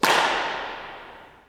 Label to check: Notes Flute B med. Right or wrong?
Wrong